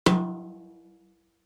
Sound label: tom brushes